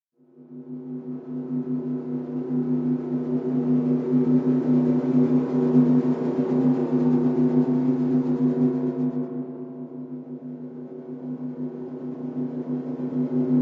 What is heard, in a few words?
Cosmic Radio Waves